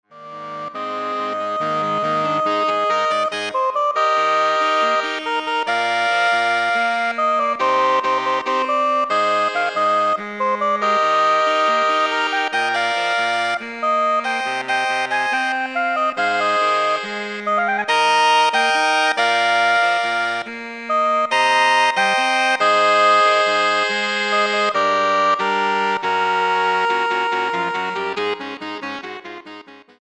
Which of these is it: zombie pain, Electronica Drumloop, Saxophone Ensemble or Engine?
Saxophone Ensemble